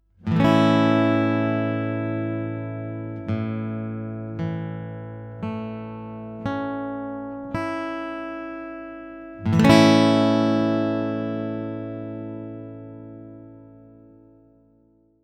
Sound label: A Major, guitar